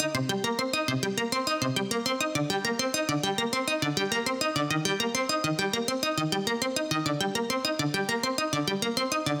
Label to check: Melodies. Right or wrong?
Right